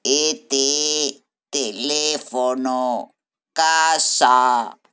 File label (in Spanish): telefono; El juego del confinamiento